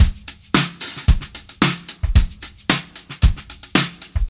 112 bpm drum groove c key, kick tuned to the C note